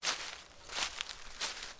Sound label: walking